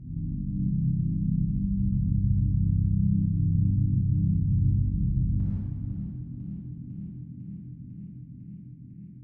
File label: amb white